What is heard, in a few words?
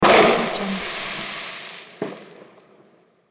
firework bang sparkle pop